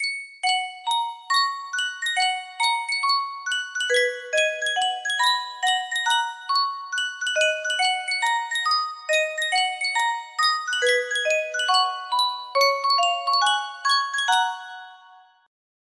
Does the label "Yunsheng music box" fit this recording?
Yes